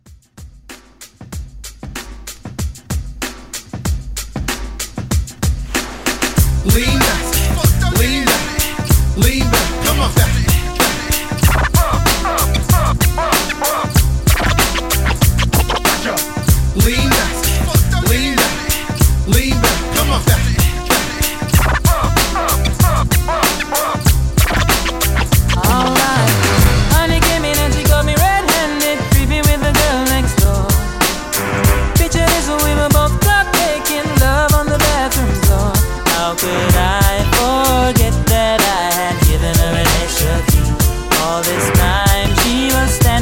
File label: Hype Scratch Re Drum 95